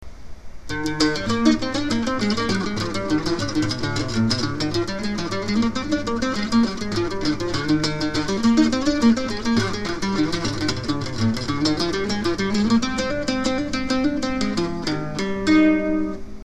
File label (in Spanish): bajo sexto